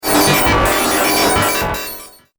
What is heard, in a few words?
Droid Data Motion, robot; Robot Sound Effects